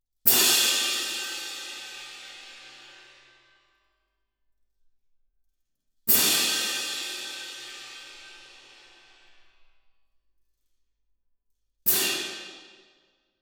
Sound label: Twin R Perc